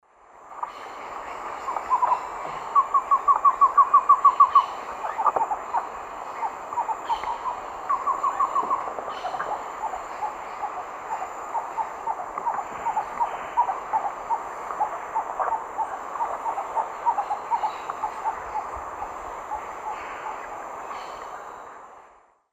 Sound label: Thamnophilus praecox, song and calls; S. America; Eastern Lowlands